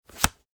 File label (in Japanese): カードめくり